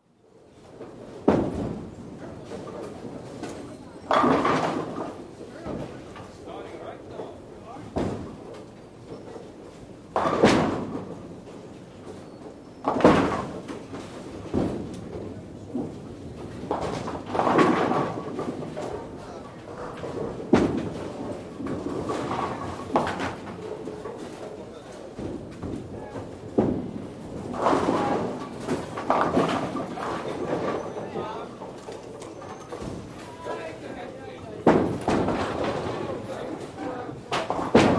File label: Santa Monica: Bowling alley; Bowling Sound Effects; Sports; BBC